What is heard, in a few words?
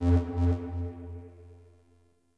UI starchart planet hover; DE; Warframe; Starchart Redux